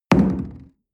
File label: coffee place tablecloth hard, restaurant cafe